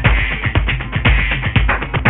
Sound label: TR, drums perc, Roland